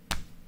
Clap; rimworld-animations

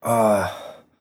Grunt, MVoice

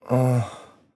Moan, MVoice; rimworld animations

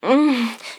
Moan Short, FVoice; rimworld animations

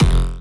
kick; noizenecio

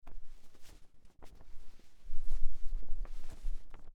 flag wave

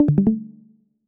stream user joined; discord

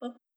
boop C#

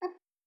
boop G#

